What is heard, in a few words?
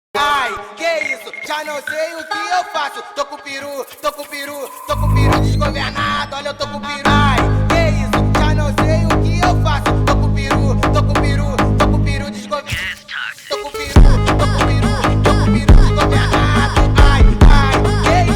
tribal bounce